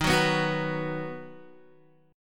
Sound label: D sharp Minor Sharp 5th D sharp down Guitar; strummed